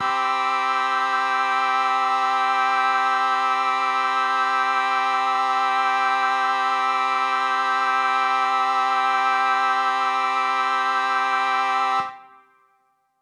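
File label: Mixed Winds C major 7, synth orchestra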